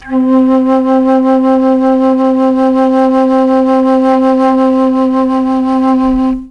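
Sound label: C2 chamberlin flute, mellotron; CHMBLN FLUTE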